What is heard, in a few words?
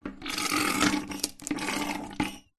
household bleach toilet gel squeeze from mostly empty plastic bottle into toilet; squelches